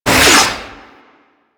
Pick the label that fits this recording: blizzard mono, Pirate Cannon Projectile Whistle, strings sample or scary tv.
Pirate Cannon Projectile Whistle